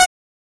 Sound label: Cursor; life of a naomi; Naomi Carrigan